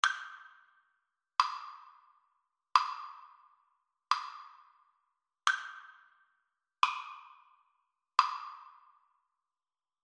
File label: Double Bass